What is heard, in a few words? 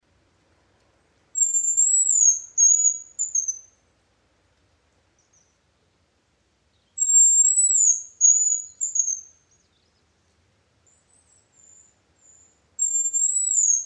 Grouse Sound; ground-dwelling bird